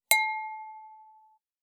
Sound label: Crystal